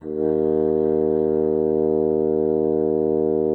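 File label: bassoon piano D#3; Xsample VOL-6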